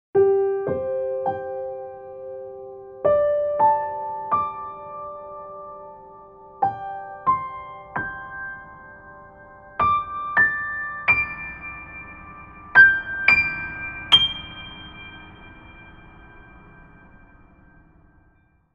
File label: comparison Bluthner hi note